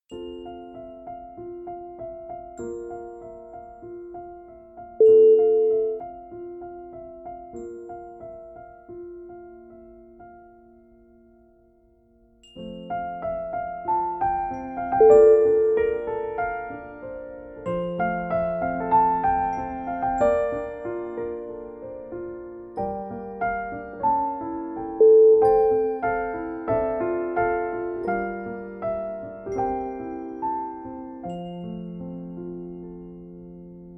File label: christmas piano lullabye